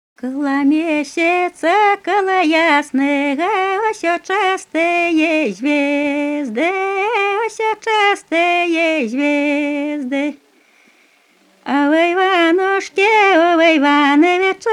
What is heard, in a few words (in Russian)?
Коло месяца, коло ясного, Музыка русского Поозерья. Полевые записи; Фольклорный фестиваль имени Ольги Сергеевой